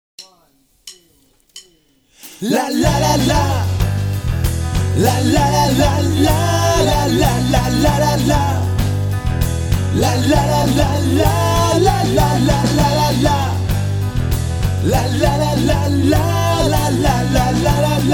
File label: Acoustic